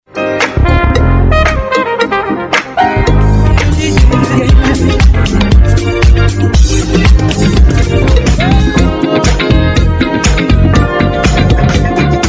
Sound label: old school mix the classics